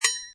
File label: Cartoon Ping Vibrato, Funny triangle or comedy impact ping.; Comedy/Cartoon; Funny Sounds